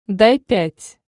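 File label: high five sounds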